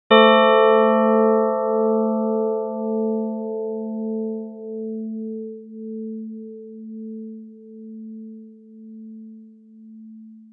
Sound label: single Bell E4